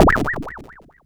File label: Perc, Nord Modular Drum Samples; Yawgmoth